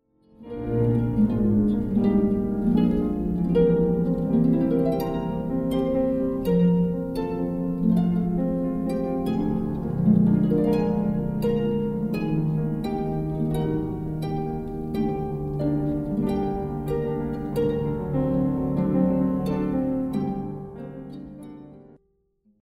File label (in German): Harfe Klavier